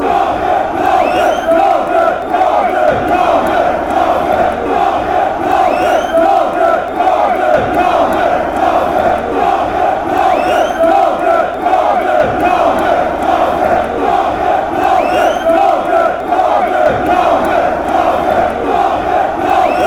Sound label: beerschot chant, fanchants